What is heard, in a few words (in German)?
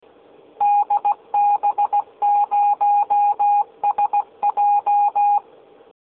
Kennung, morsen